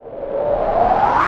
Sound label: Reverse FX; Vengeance Samples